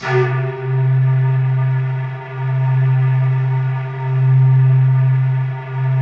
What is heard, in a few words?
C2, ANALOG SEQ; Roland JV 2080